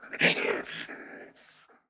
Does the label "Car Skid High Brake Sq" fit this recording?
No